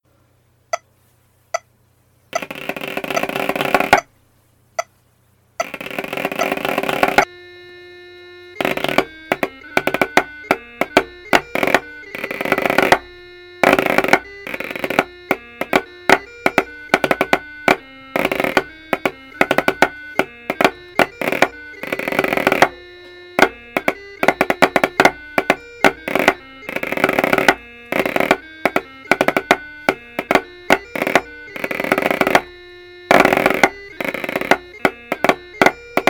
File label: Snare Chanter